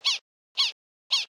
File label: beaver moaning; petz